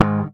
SHRT G#, Bass; Sampleheads; Will Lee Bass Library

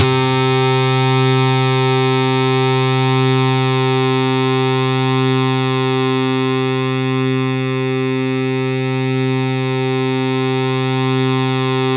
C3, Synth Line; 90 s Sample CDs; NiceBeats; SynthLine VOL-33

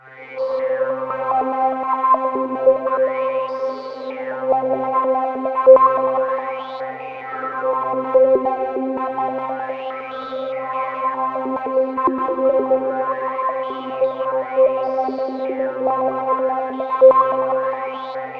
C3, TECHNOPAD, Roland JV 2080

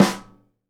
SNARE RIM, Live Snares

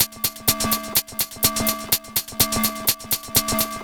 Hats, 125bpm, Nu Dance Electro; Zero-G; Soundclash; Combi Loops; Kaos Theory

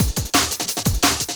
175, Breaks; Drum&Bass; EZ Rollers

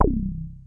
JUPITER 8 C2; Hollywood Edge; Giorgio Moroder Rare Synthesizer Collection

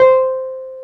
PNO T.Wurly C 4; Keyboards of The 60's and 70's

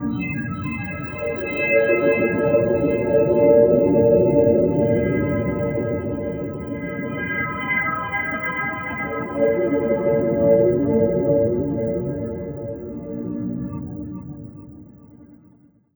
eerie landscape, One Shot Textures; Transmission-X

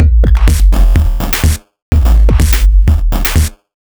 Feel My 54hz, Drum Loops, 125 BPM; Sonic Foundry (Sony Creative Software); Underground UK House & Electro

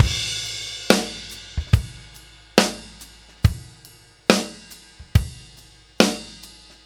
Ballad Loop, Drum, 70s Ballad; Drum Tools

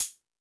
High Hat, E-MU Pro-Cussion

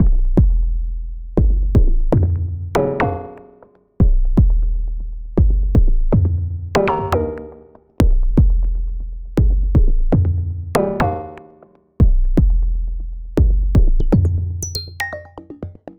Tonal Beat, Modular Electronica; Beats; Sonic Foundry (Sony Creative Software)

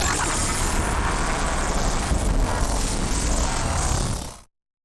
Malfunction Synth, malfunctioning synth samples

